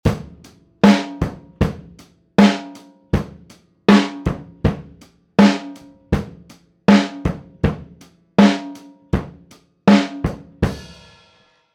echorec drums

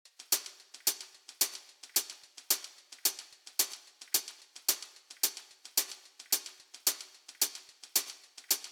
Hat Loop Dry

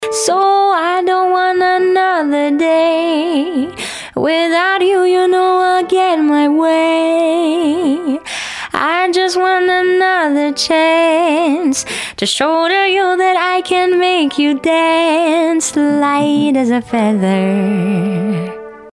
Pop Vocal Urei 1178

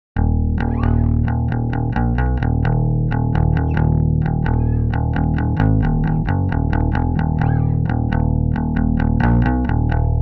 Electric Bass Cut Through The Mix

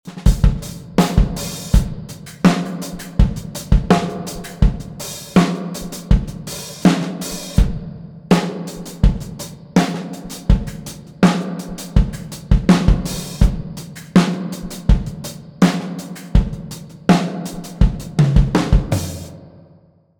Drum Buss Tanks Twang

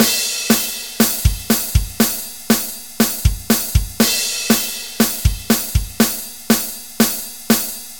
metal drum loop dry

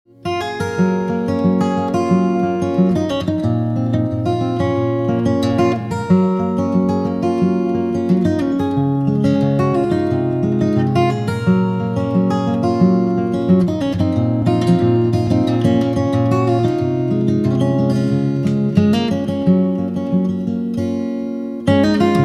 Acoustic Guitar Air and Focus

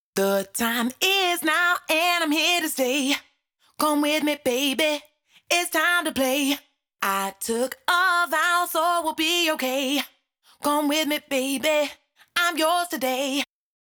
Vocal Sources Dry

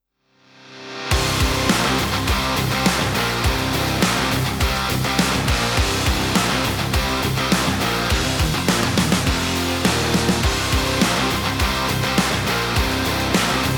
Hard Rock Mix Hardware

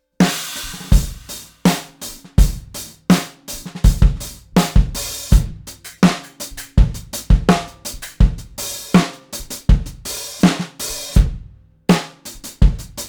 Drum Bus Stereo enhancement; Clarity MS

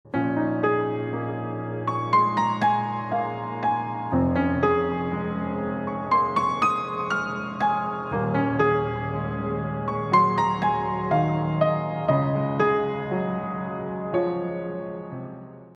Piano Unprocessed

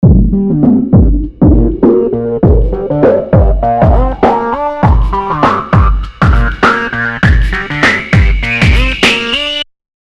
analog filter

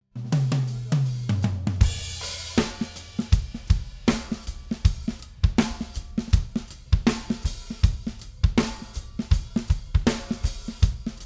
Drum Bus Dry

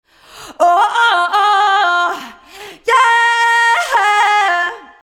auto-tune

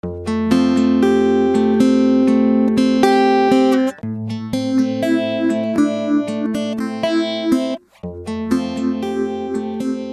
phaser

